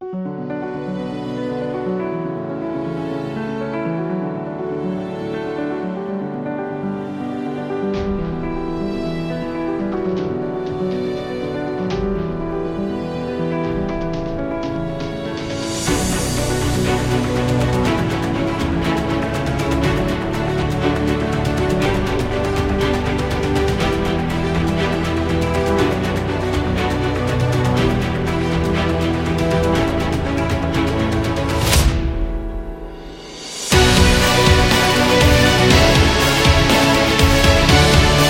The Motivational

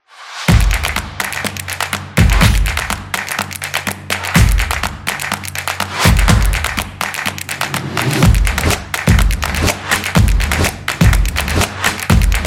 TV Show Background

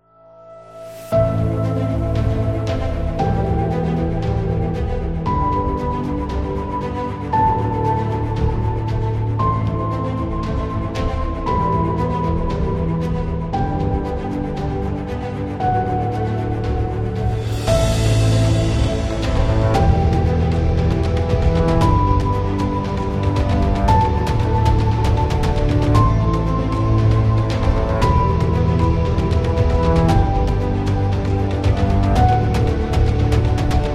Emotion Cinematic; waiting music

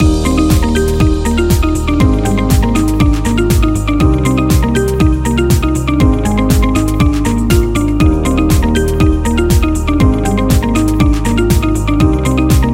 Happy Loop

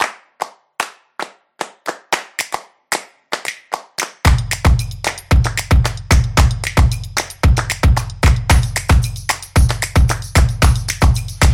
A Percussion Promo; football drums

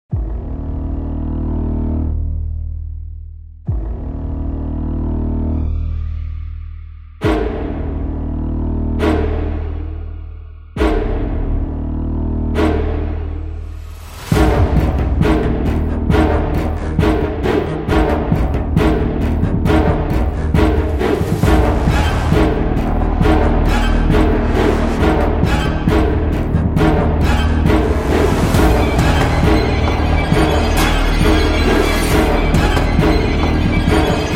Horror Thriller

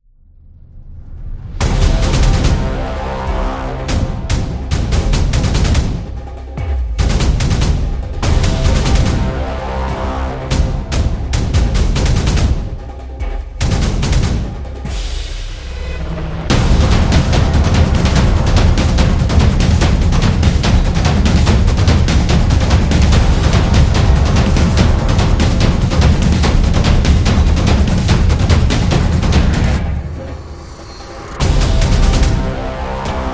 War Music, war drums

